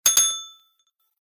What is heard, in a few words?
Hotel Reception Desk Bell; Bell Sound Effects; Andrew Fleming